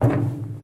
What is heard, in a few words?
metal Walk